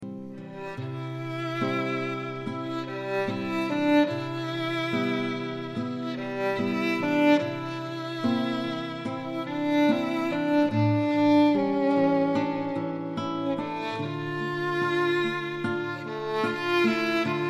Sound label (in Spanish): canción interpretada por violin y guitarra